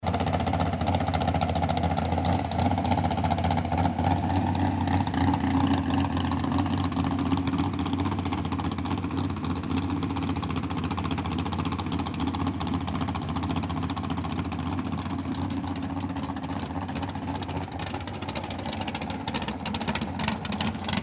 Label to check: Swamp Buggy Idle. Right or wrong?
Right